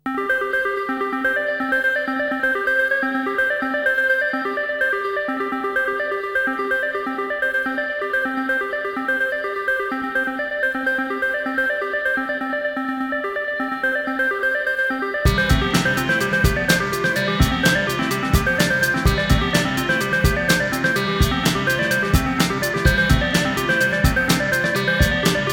Electronic Wizardry Demo

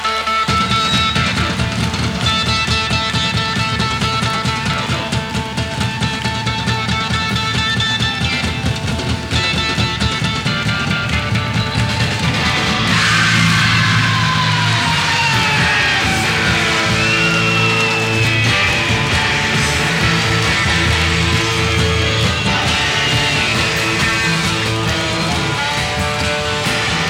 Drum Solo; Toronto; December 11, 1975

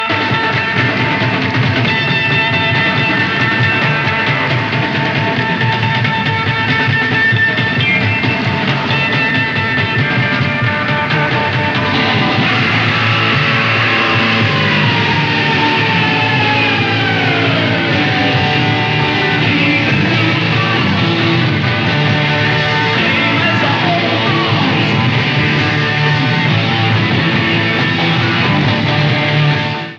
Drum Solo; Edmonton; December 23, 1973

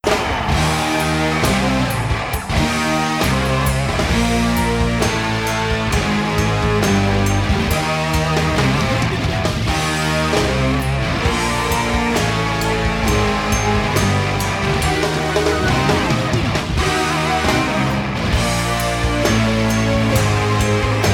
Horror Rock; USA

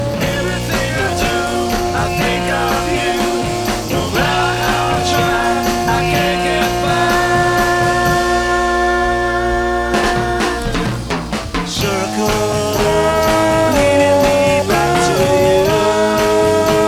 Horn Mix

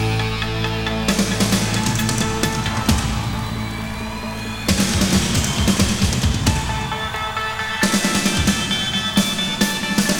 Live Drum Solo; Single "b" side version - Drum solo and scream

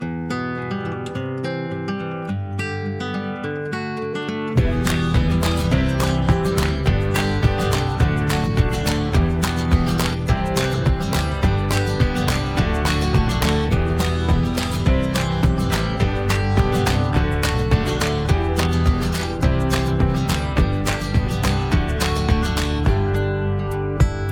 Instrumental

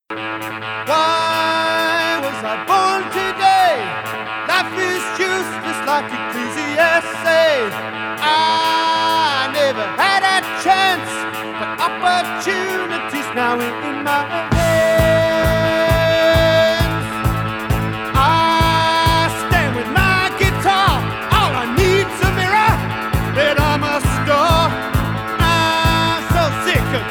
Solo

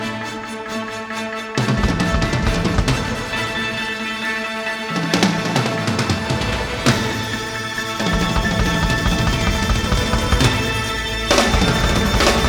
Drum Solo

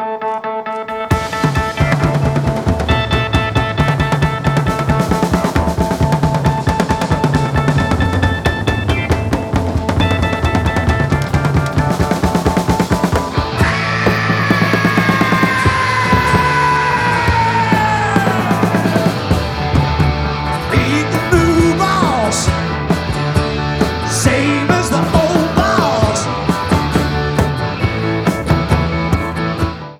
Drum Solo

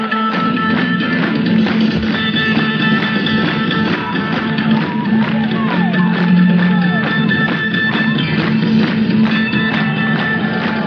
Drum Solo; Charlton 1976; 05-31-76

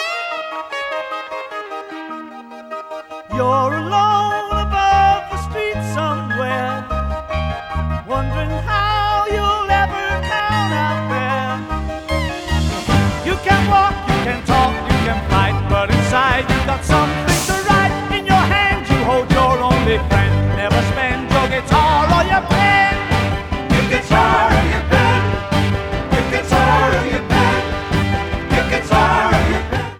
Guitar And Pen Remix

